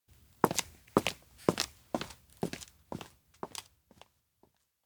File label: steps outdoor walk away; awayuki; anemoi